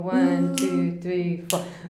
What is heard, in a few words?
VOX, Singin